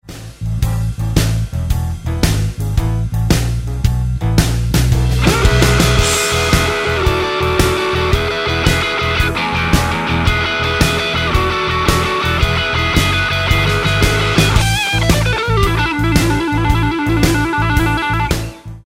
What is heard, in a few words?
Roadhouse Licks Lick, guitar; 20 Roadhouse Licks